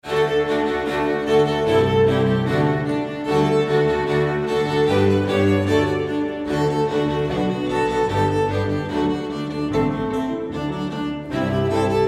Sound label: Fiddle; Everything String